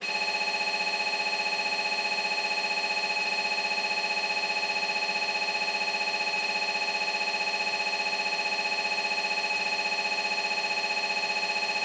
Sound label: siemens 3t magnetom prisma fit whole body human scanner mri rs fmri ep2d rs bold